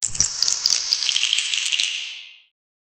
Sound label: a vitality sucking spell; spells